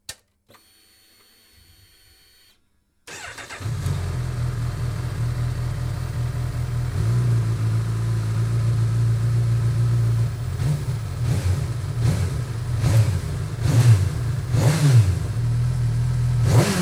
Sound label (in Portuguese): Audio Motor